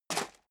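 step leather shoes gravel medium single left, footsteps; schritte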